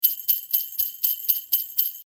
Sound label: Sleigh Bell Percussion; iMovie Sound Effects